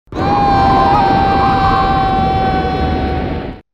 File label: Falling (Wind); Mortal Kombat Mythologies Sub-Zero